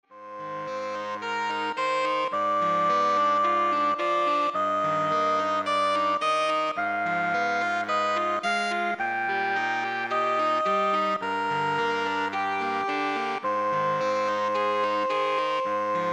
Saxophone Ensemble

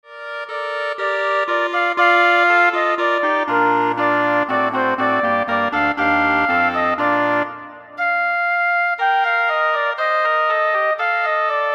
Woodwind Ensemble

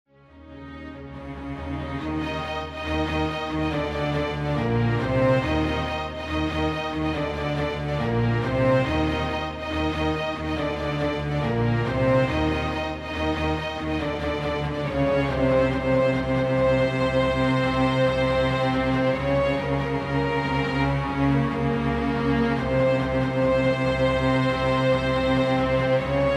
String Orchestra

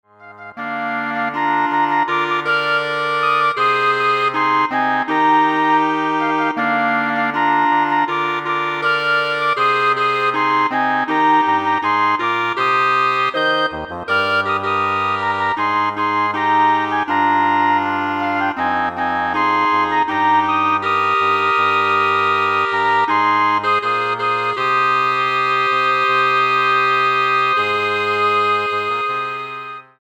Woodwind Ensemble

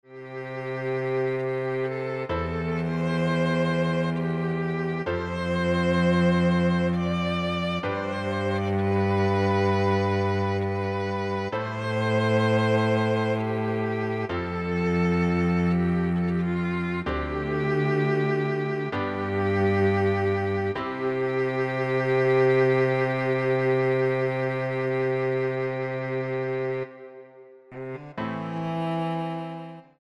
Piano Trio

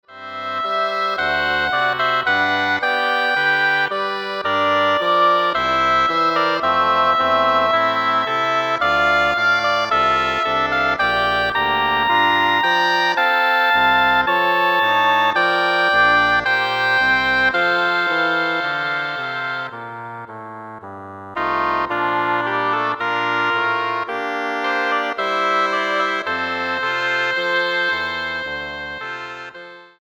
Double Reed Ensemble

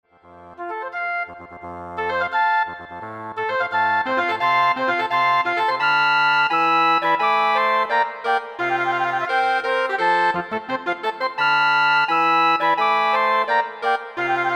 Woodwind Ensemble

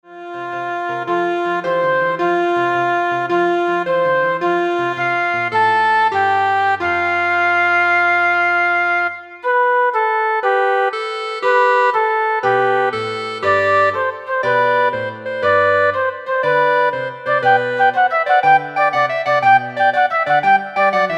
Flute Clarinet

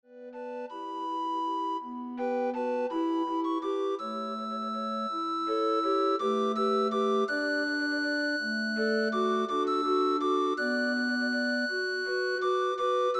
Recorder Ensemble

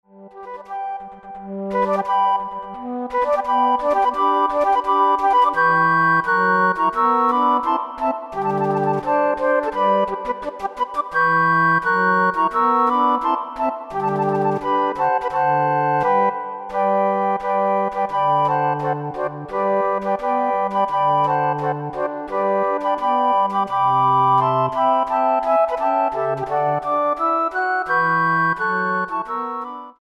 Flute Ensemble

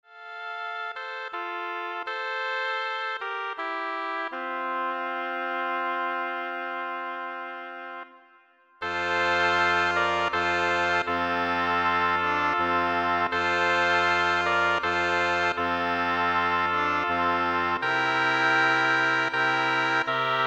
Double Reed Ensemble

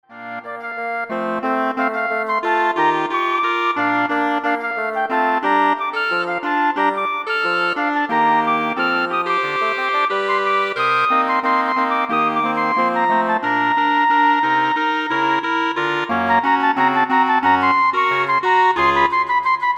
Woodwind Ensemble

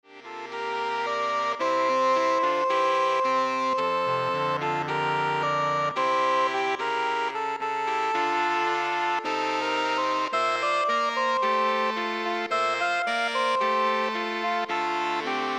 Saxophone Ensemble

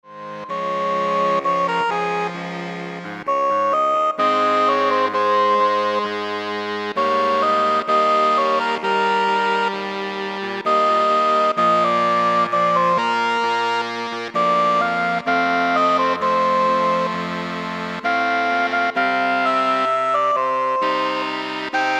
Saxophone Ensemble

